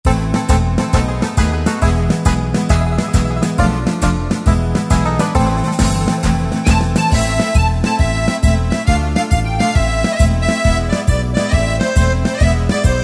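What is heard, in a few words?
Tarantella, Yamaha YPT-400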